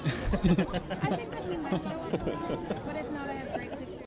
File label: Voice - Party Noise; Scratch